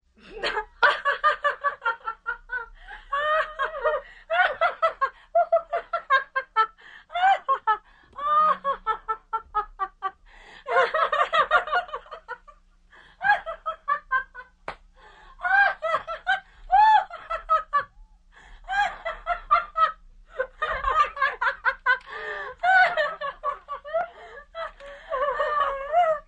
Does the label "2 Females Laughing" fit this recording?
Yes